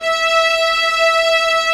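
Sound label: viola legato; Multisamples; Digital Sound Factory Virtuoso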